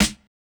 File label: Snare Hit; 102 Fmin